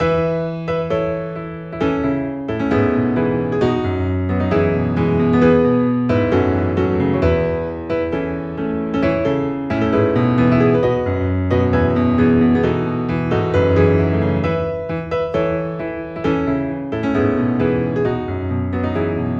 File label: steinway